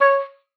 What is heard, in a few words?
C#5, OBOE; Xsample VOL-6